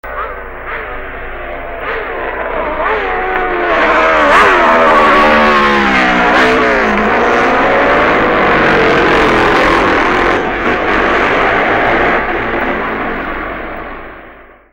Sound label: Benneli Norton; 1967